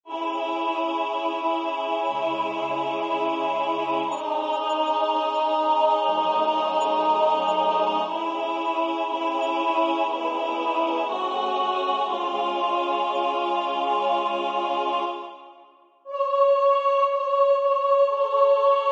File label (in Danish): sopran 1; Peace I Leave With You